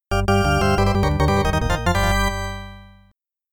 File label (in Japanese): ゲームセット; Face Off; arcade